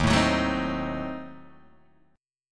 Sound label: Eb Diminished 7th Eb, Guitar Open G